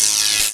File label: draw; gesource; xshemps customs; moonraker